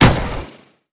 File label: attack; dota; monkey king